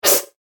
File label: Wind Strike Whoosh; Whoosh Sound Effects